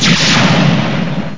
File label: photon torpedo